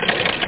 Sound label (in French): CHENILLE; Flashback